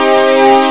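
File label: gitarrr; Epic Collection 3, The; Epic Marketing; muzak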